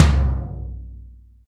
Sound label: TOM, Drums; Best Service; Real Mega Drums VOL-1